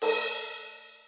ride, Millenium MPS-400

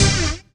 RAVE, RAVESYN

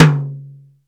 R-8 dazzling tom, Dry

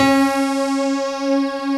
PIA SYNTH; Kid Nepro; Elite

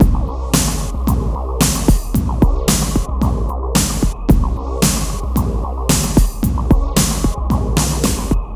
Darkwave, Processed Percussion

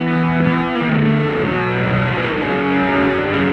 Slip Down 5th, Electric Guitar; Sonic Foundry (Sony Creative Software); Friendly Fire; Serpent

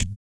Blues Soul; BOOGALOO; Spectrasonics; Vocal Planet

